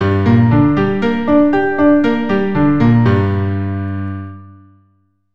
Gm arp 100, keyboard

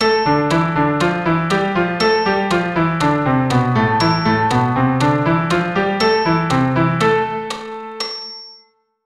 Am natural

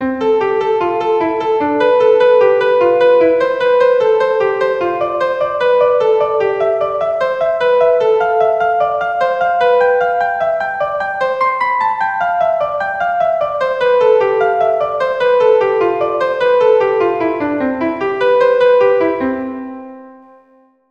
Scale

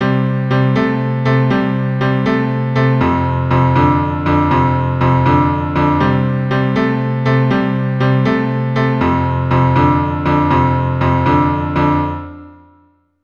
Boogie Woogie bass, keyboard

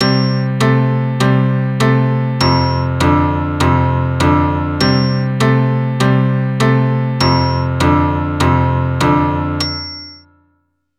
Boogie Woogie bass, keyboard

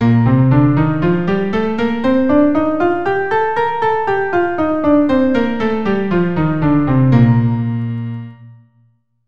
B flat Major 100, keyboard

